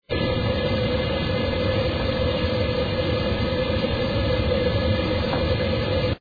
generators; co podrush

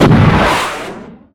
rocket; ragemap2023

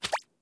slide; fallguys

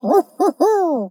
player victory; fallguys

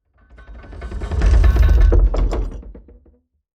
seesaw stop; fallguys

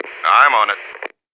sack; co perkele

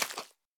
footstep slippery; fallguys

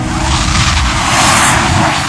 close; hibame

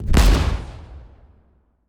canon fire; fallguys

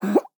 player jump; fallguys

